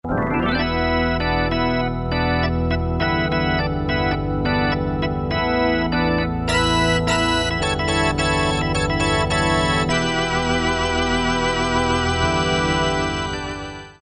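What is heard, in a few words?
Rock organ; Factory Presets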